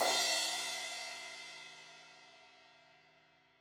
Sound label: Crash 16in edge, Cymbals; Deeply Sampled Drums